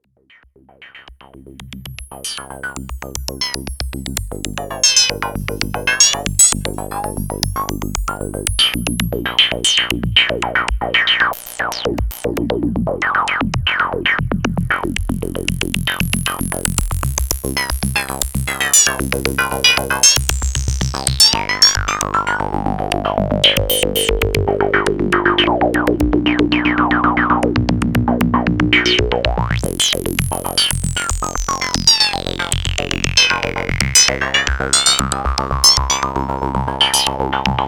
sh5 ring Mod FX

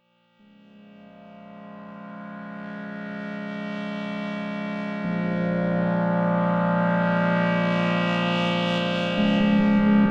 Pad; FredsLab

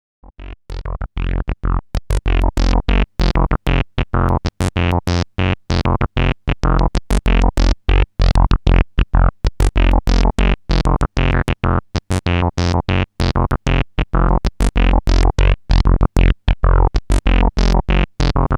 Seq D

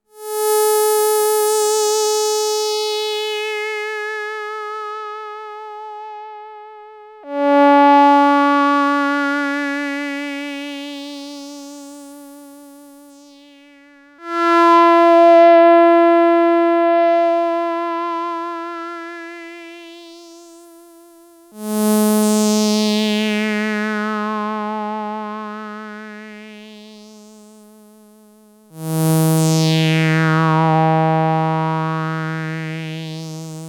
sh5 bandpass lead

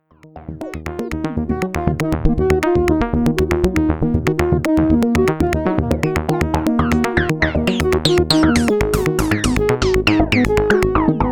ARP2600 Lead Bass

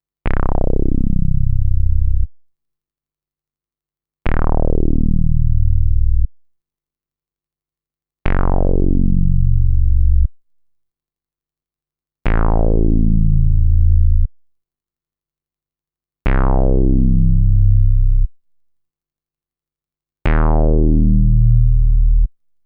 SQ Bass, synthesizer

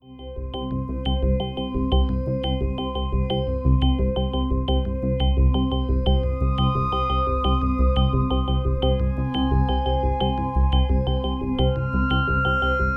ppg wave xpander, synthesizers

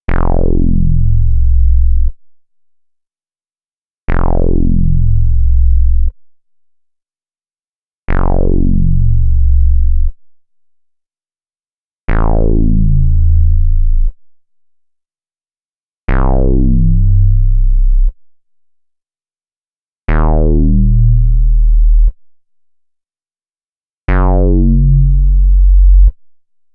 SQ Bass, synthesizer

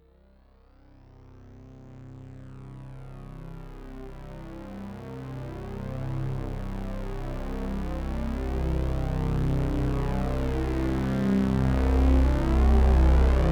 Arturia MatrixBrute

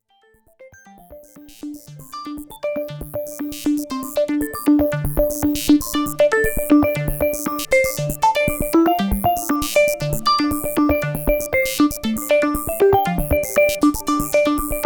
ppg wave

